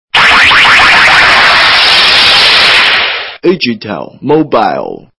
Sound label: Power off, phone; AgTel AG Race